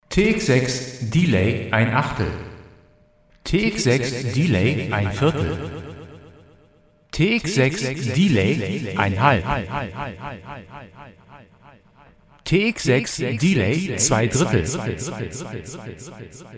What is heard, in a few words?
Teenage Engineering TX 6 Delay